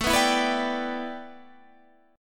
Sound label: A 7th Suspended 2nd Suspended 4th Guitar Standard; A7sus2sus4 strummed